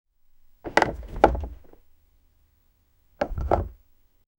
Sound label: cozy casual game style